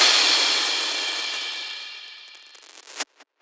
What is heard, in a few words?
crash one shot, Cymbals; GhostHack